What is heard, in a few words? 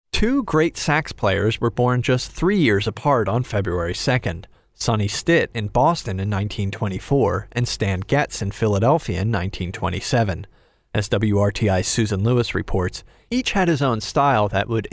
Sax